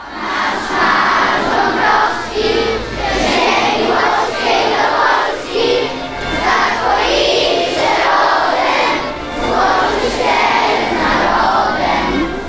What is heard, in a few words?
hymn